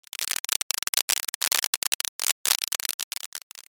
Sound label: applause